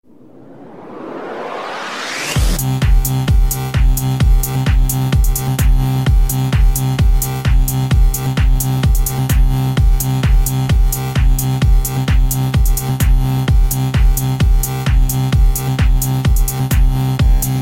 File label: Tone